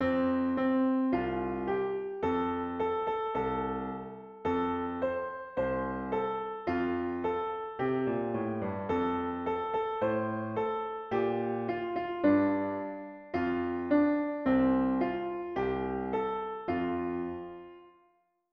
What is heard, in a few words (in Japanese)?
ピアノ